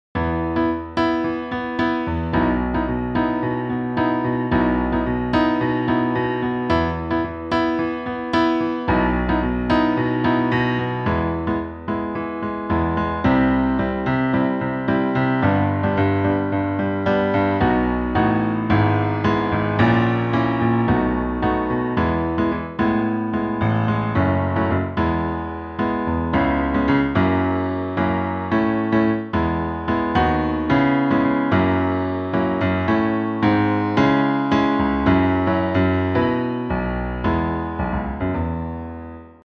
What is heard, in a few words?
Piano Only